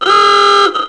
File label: ahooga